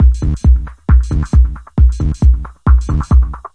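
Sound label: 135 bpm dark straight kick bassline; 131 to 140 bpm; samples-ghost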